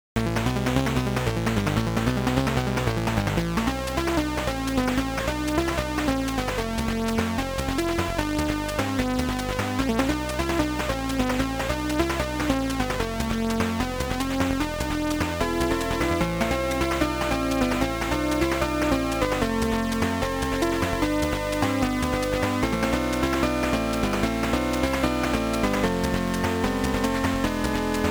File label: BGM; Legend of Game Music Consumer Box; Victory Run